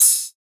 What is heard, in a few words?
Open Hihat; Drum One Shots; Cymbals; Hihats - Open; Cymatics; Cobra Hip Hop Sample Pack